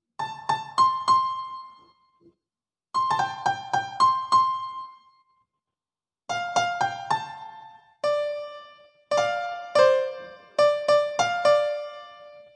intro music

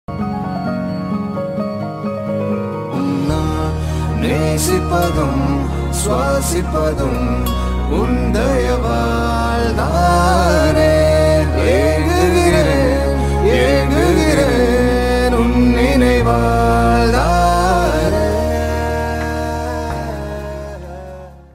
tamil romantic melting love

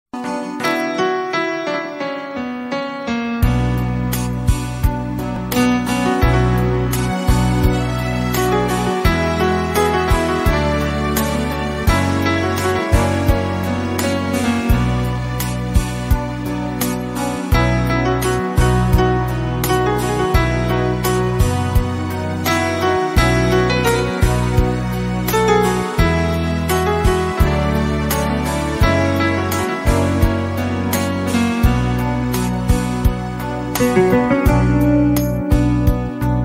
instrumental ringtone rabindrasangeet

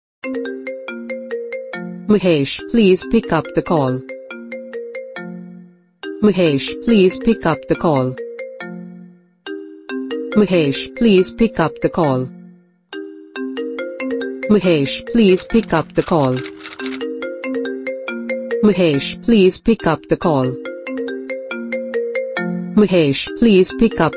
iphone ringtone; apple ringtone

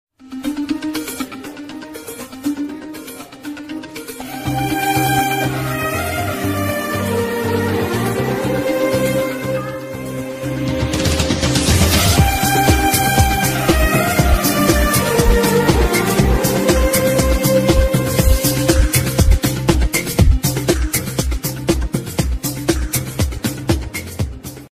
love bgm

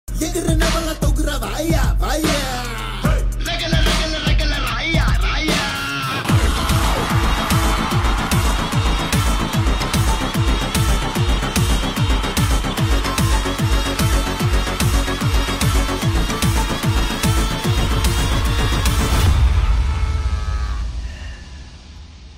attitude bgm ringtone; raayan; dhanush

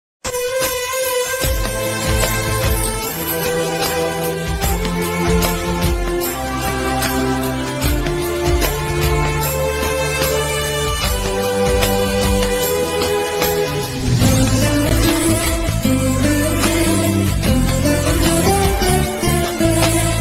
love bgm; kaakha kaakha

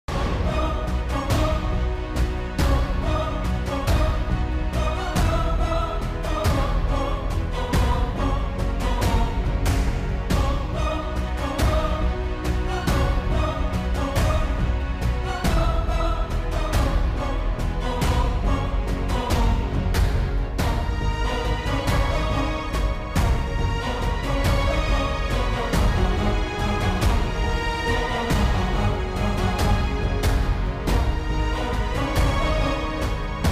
drama bgm music; mujhe pyar hua tha drama

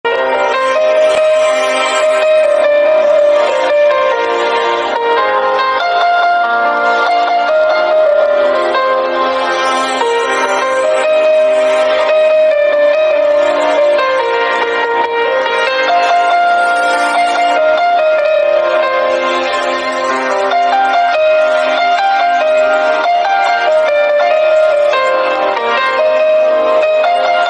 sad bgm

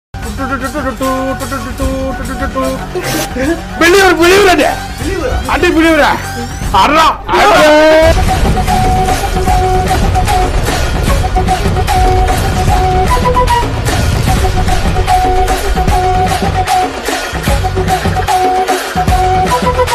manadu bgm ringtone; dance

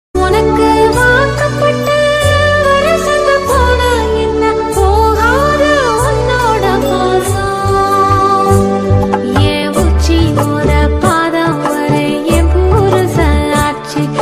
tamil bgm

